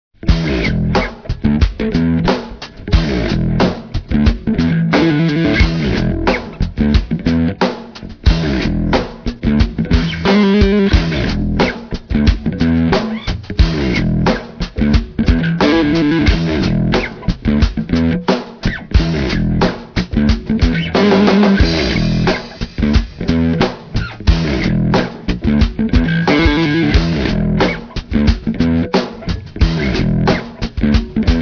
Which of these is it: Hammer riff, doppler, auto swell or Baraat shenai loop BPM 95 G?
Hammer riff